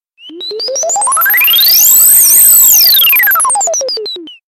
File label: 3D Sms Tone